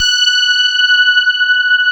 SPARKLES; Wizoo - Powered DX

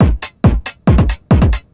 drm 138, techno drum loops

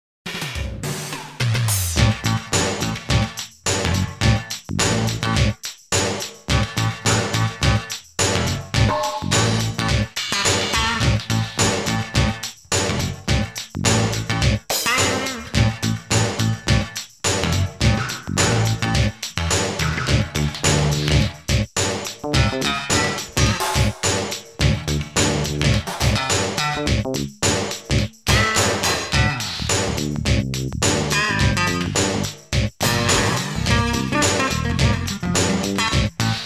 dr&bass; behind you; KORG - Sound Sampling Collection VOL-5 - Drums & Bass Fusion Funk Effect

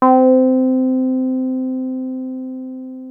303 C4, TB 303, Synthesizer; Hollywood Edge; Giorgio Moroder Rare Synthesizer Collection

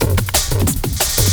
LOOP, Drum & Bass, 180 BPM

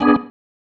Stab One Shots, Organ

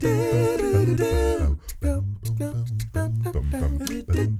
Accapella, Doo Wopper, Blues & Soul

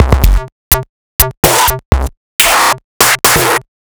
Percussion, 125 BPM; Sonic Foundry (Sony Creative Software); Underground UK House & Electro

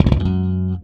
Slap One Shot F#, Bass; Bass X

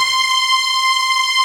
HIGH STR, House Dance; 90 s Sample CDs; Best Service Dance Mega

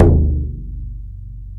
Bodhran Lo; Planet Earth; Digital Sound Factory Planet Earth

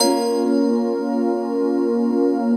KEYS; Houseworx

